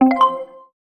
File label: stake up; Clover Rollover 2